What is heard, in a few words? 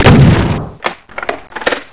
FIRE SHOTGUN